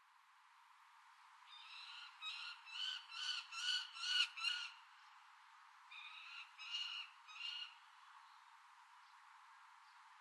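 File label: Peregrine Falcon; muroan; koe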